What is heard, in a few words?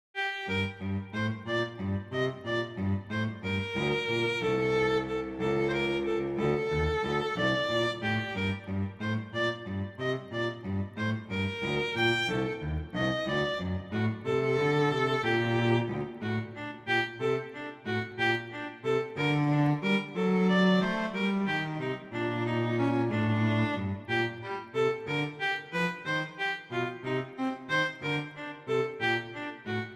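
Andante non troppo lento, violin cello; Mazas; Duets; Duet 6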